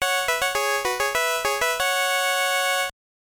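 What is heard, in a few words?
phone notification sounds in 8-bit style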